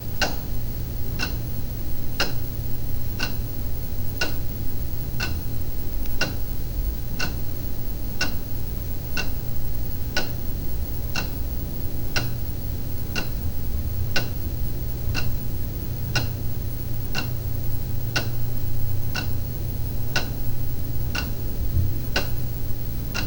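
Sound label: bedroom ambience, creepy; fnaf 4 style bedroom ambi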